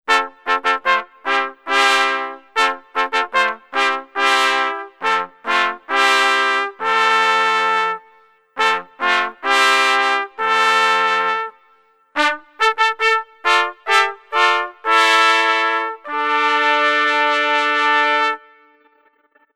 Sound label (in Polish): Hejnal poglos